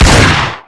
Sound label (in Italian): sparo, armi